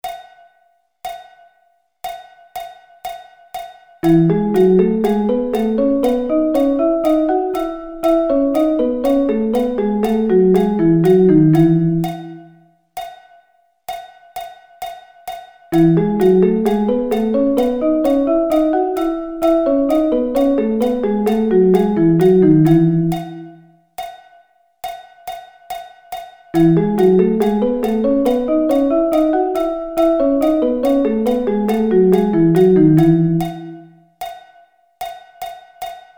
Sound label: E Thirds